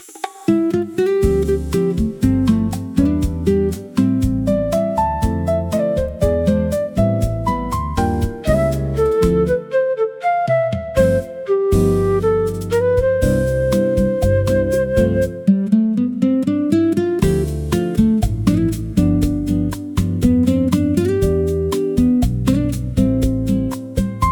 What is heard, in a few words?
jazz welcome